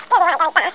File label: go barf; charley cat jap cat